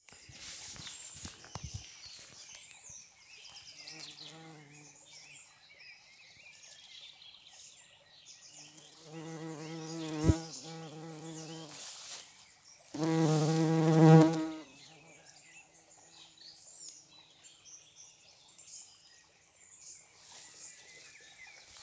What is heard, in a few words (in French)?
Bourdons